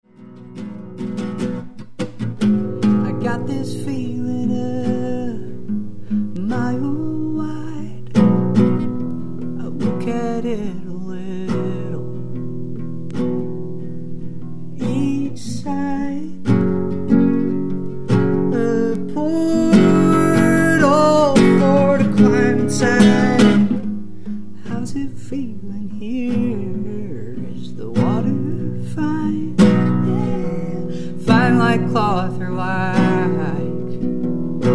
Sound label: acoustic